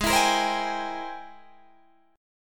G sharp Augmented Major 9th G sharp Guitar Open D

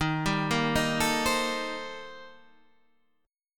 C Minor 13th Eb

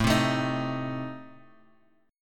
A Diminished A, Guitar Open F; 9,7,9,10,x,x; strummed; JGuitar

